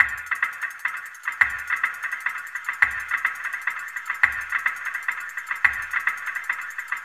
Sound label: FX LOOPS; Zero G; Malice In Wonderland